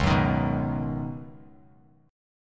C sharp 9th Suspended 4th C sharp down Bass Standard, chord; C#9sus4; strummed; JGuitar